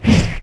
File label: Tail swipe, Dino